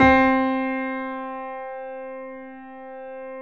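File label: grand piano C 3; USB Soundscan; AKAI; Keyboards Acoustic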